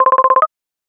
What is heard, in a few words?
ringer, chirp